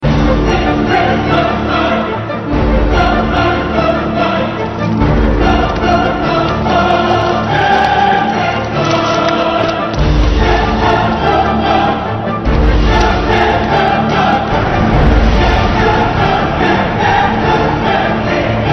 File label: Orchestra